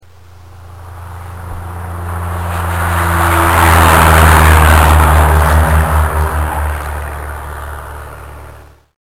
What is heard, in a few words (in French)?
avion